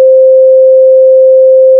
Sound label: pure tone